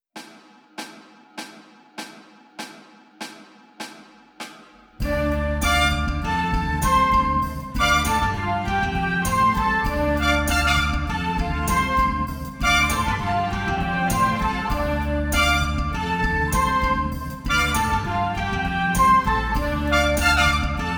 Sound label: Recorder G; Recorder Superstar; Learning Speed